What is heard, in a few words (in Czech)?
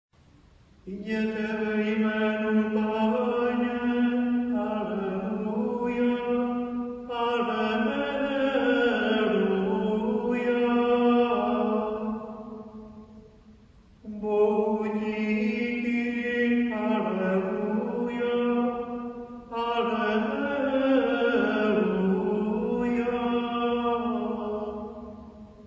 propusteni velikonocni choral